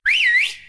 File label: whistle, notifications; ZTE Grand-S-2